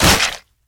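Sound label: enemy short single shot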